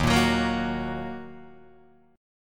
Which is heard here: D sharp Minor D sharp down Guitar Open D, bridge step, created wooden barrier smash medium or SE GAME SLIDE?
D sharp Minor D sharp down Guitar Open D